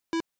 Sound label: NES General Beep